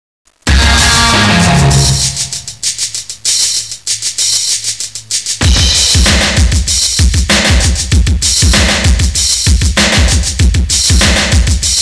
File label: drumbeat